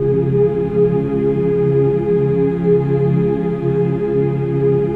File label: LC MAJ MM C#5 L, Symphony of Voices; MAJ.MMCHD